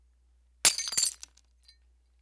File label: tap mug on ground